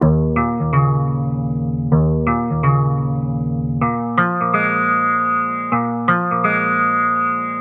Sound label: 126 Eb Bb MUSIC; funky; Loopmasters; Funky Underground Vol 2